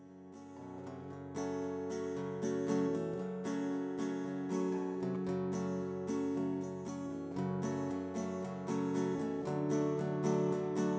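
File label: with drums, source; SiSEC11; underdetermined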